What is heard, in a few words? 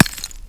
sand impact bullet; surfaces; tfa starwars melee weapons pack; nmrihimpact